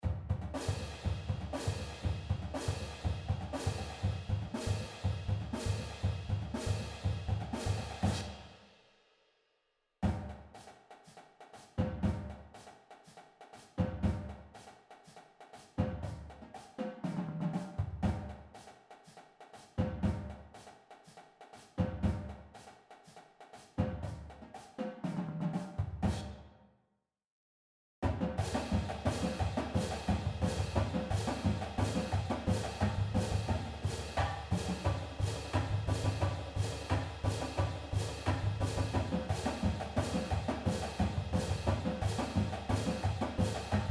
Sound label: Stand Beats